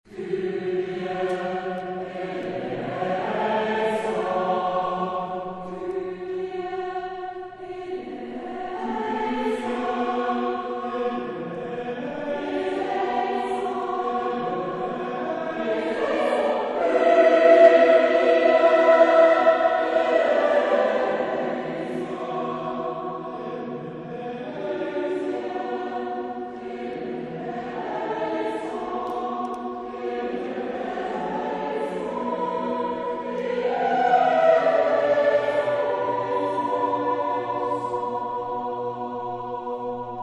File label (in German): Kyrie für vierstimmig gemischten Chor